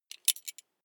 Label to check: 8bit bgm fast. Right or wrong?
Wrong